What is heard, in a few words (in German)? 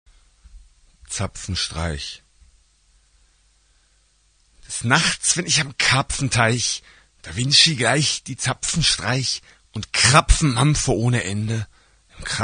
zapfenstreich